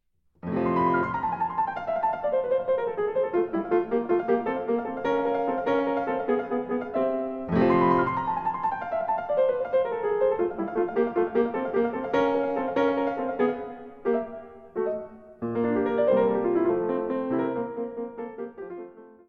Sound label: Sonata in F Major, Allegro assai